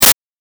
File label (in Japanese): 8bit ダメージ